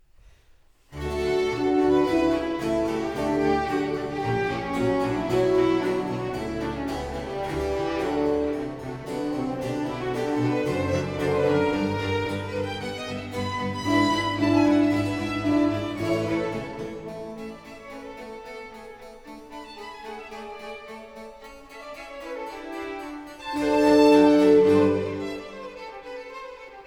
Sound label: Allegro, Keyboard Concertos; CPE Bach; Wq 43